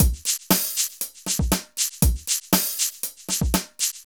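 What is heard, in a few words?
drum loops, right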